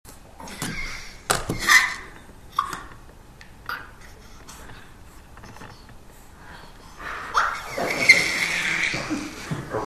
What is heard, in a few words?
squeal